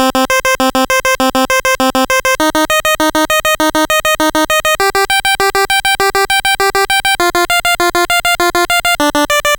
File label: NES Synth, chiptune